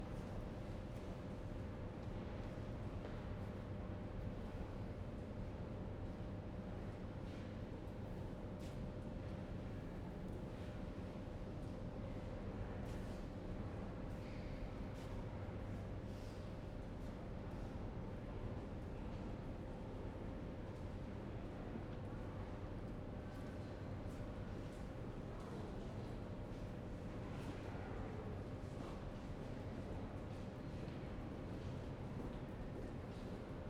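platform ambience train arrive departure twice, SUBWAY; Quadro; Borys Peter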